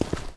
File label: Step; Waterski; Neighbours From Hell Console Edition; GC, Xbox